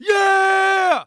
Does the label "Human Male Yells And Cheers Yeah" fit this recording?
Yes